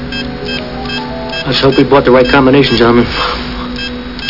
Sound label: communicator; Amiga 8-bit Sampled Voice; worms dc; arnie